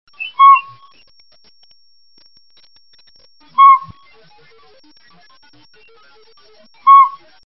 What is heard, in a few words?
northern pygmy owl